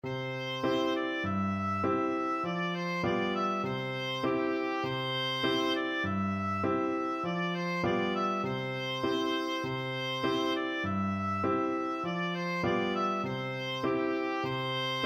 alouette, oboe

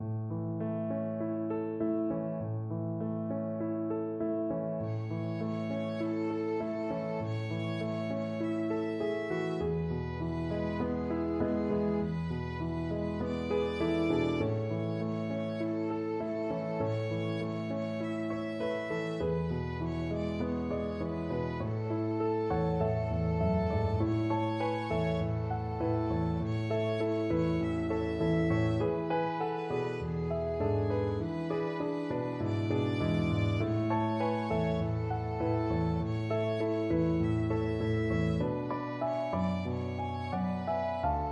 skip to my lou violin

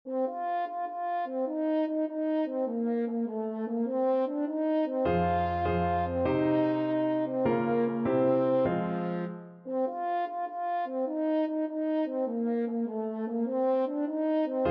french horn

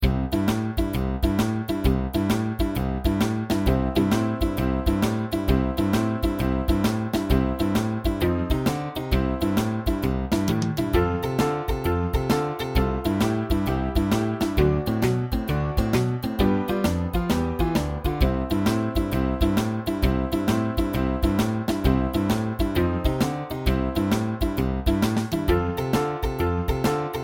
jingle bells boogie FL kar, Flute

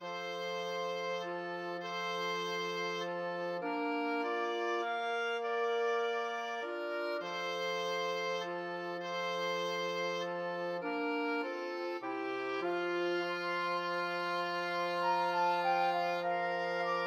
flexible mixed ensemble, 4 players

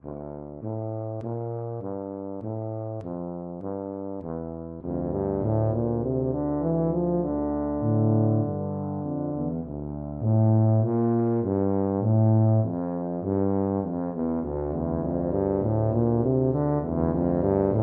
submarine warfare TBA duet

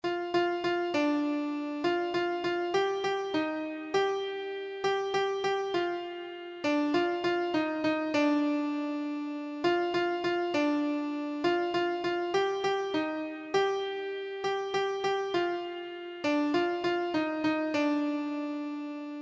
how great thou art, keyboard

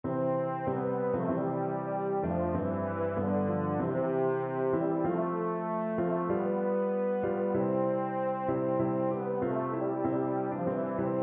euphonium

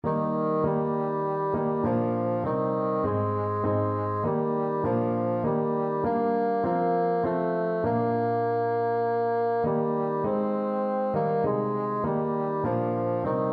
bassoon

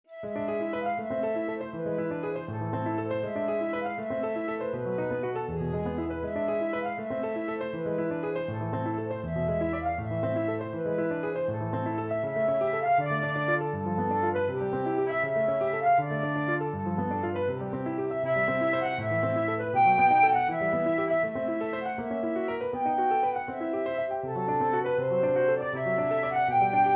flute

